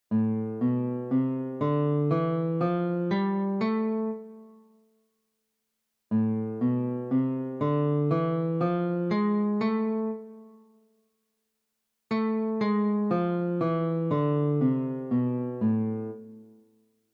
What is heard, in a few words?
minor, augmented minor